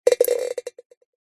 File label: nature water drips sudden short metal container small